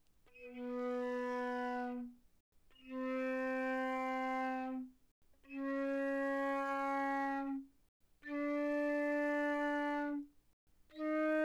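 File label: Flute non vibrato mezzo forte B3 B4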